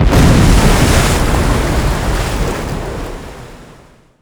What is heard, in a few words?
large water explosion; WAR